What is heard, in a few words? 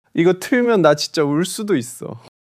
crying